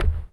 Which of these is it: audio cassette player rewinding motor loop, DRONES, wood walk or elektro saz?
wood walk